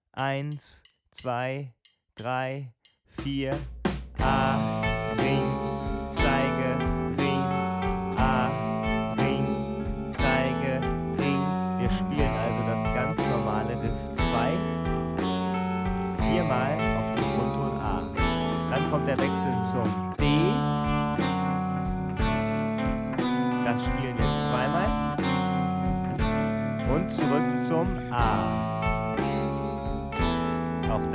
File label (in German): Riff mit Standard Bluesschema Playback, Bluesgitarre